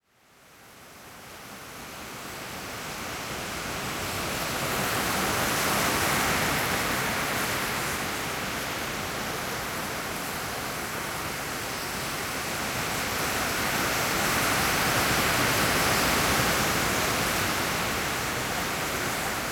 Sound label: Ocean Sounds Nature Sounds